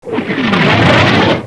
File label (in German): wurf; firearms